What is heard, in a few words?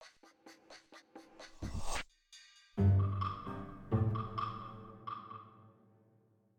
Field Battle Minus Easy; the legend of zelda tears of the kingdom